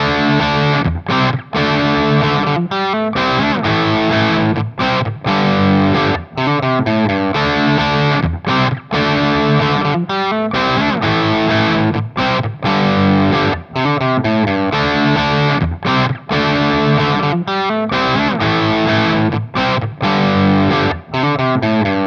Mooer TS; Audiovergleich Mooer Nux

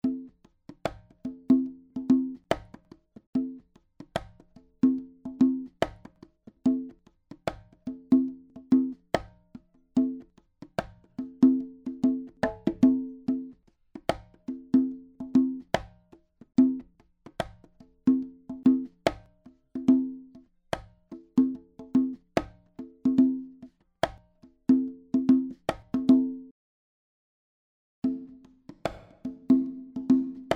Small Chamber

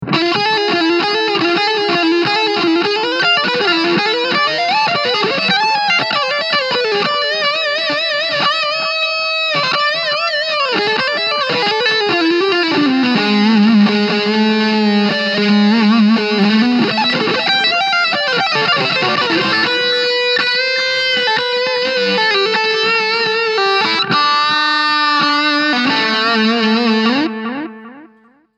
Mooer Hornet 30 Triple Digital Delay

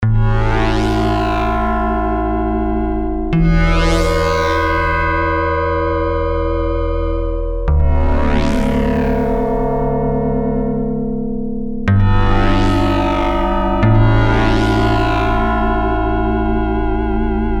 SciFi Intro

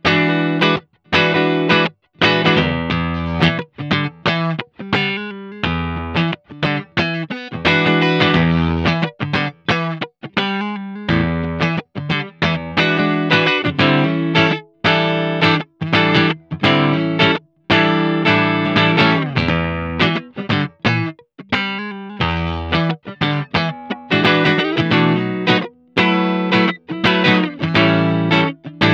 Profiling Kemper Clean; Profiling versus Capturing

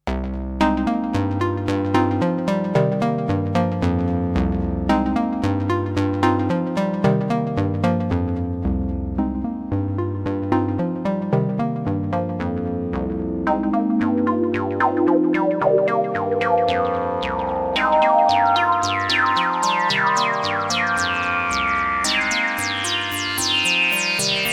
filter env osc shaping

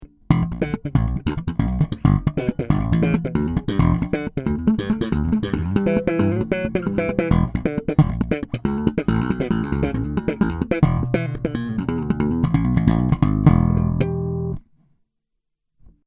Cort B4 plus AS Slap

